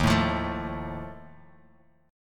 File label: D sharp Suspended 2nd Flat 5th, Bass Standard; strummed; JGuitar